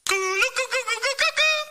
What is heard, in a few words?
Coo Roo Coo Coo